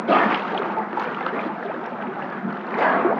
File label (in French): 2 souffles, globi